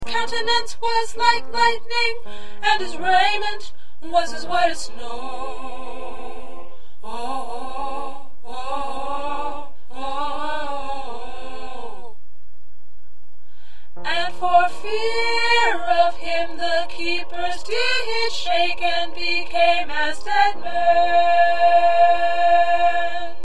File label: Alto, choir